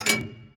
gun reload; World of Tanks

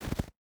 footstep barefoot snow jump land; Rust

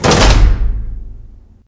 door bunker close; Doors; ModernWarfare

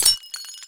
glass shards; Call of Duty Modern Warfare; physics

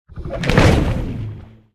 torpedo; World Of Warships

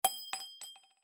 shells 20mm bolt

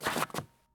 stop metal; Escape from Tarkov

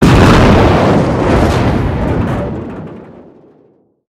damage vehicle; World Of Warships

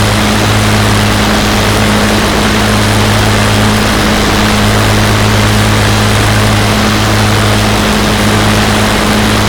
Aircrafts Engines DB605; DCS

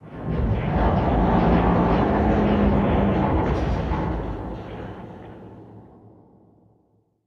damage vehicle; World Of Warships

